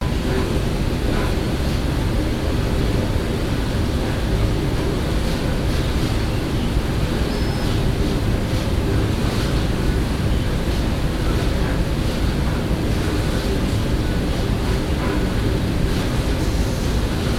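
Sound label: Factory Operations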